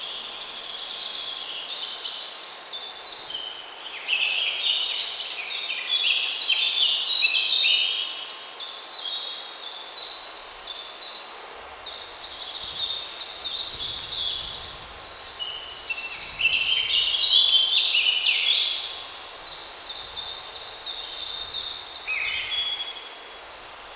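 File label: Ambience Golf Crowd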